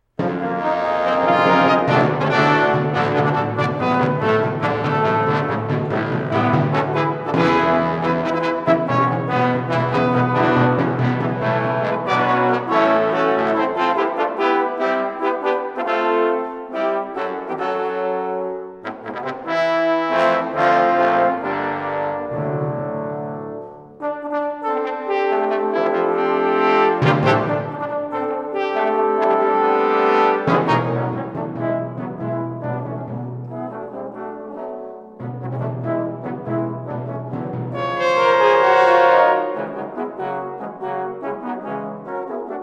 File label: Fanfare, trombone; Kazik